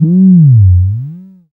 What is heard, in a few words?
bass f#, Talking Bass